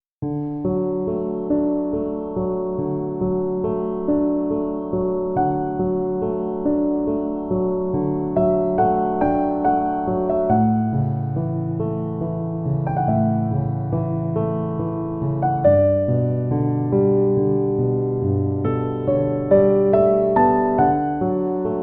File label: piano